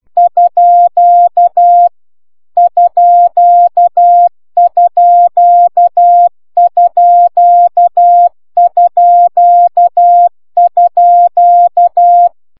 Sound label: cw